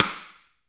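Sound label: Snare Drum Rim; Best Service Gigapack 2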